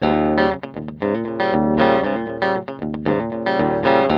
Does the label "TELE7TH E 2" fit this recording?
Yes